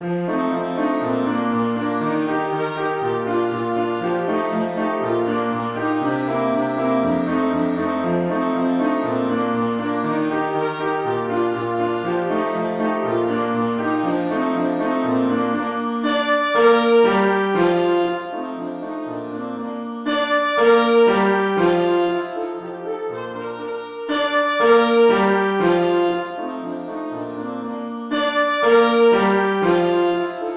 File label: can can alto sax